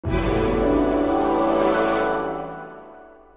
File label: Success